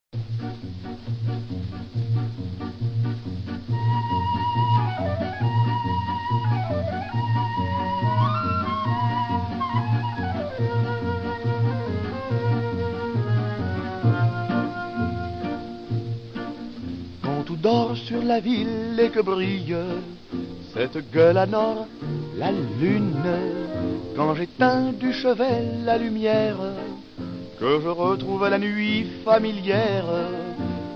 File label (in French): CHANT